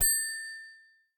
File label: bell